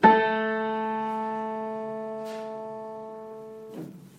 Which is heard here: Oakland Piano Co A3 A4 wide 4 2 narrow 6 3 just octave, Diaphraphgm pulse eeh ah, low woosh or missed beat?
Oakland Piano Co A3 A4 wide 4 2 narrow 6 3 just octave